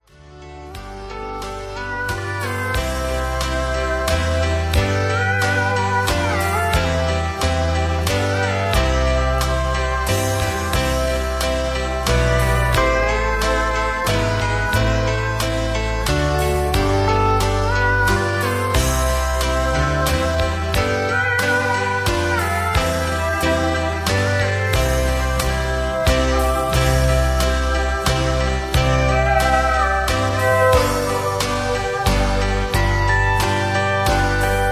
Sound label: High Key with No Leads